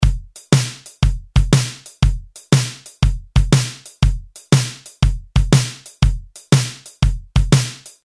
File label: SHUFFLE1, Roland R-8